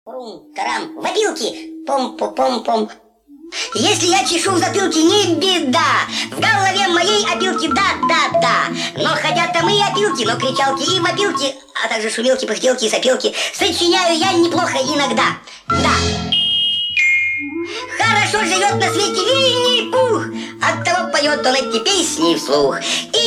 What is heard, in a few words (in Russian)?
ПЕСЕНКА; ВИННИ-ПУХА